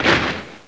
formation shield; Caesar III

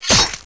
spear attack; Caesar III

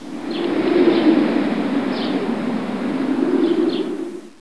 empty land; Caesar III

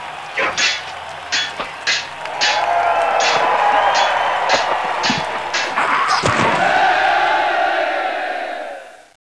ampitheatre; Caesar III

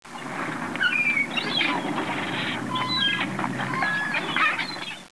WHARF; Caesar III

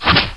arrow hit; Caesar III

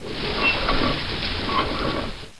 GRANARY; Caesar III

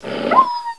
WOLF DIE; Caesar III

